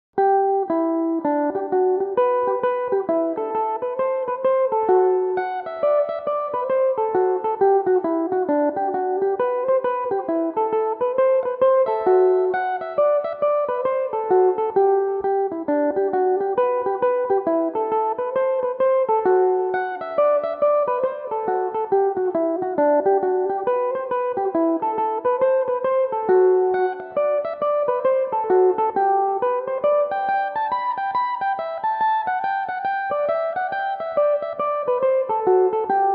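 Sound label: G major, Irish mandolin; The Permanent Way; Belmuse EM-190 electric mandolin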